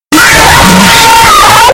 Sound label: loud noises